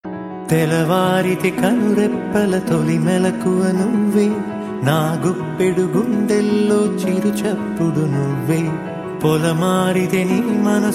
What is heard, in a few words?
ringtone, telugu ringtones